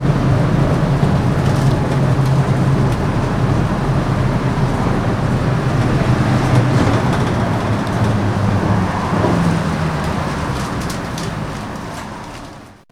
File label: BUS STOPPING; 1000fx